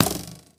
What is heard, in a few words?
bow hit; genshin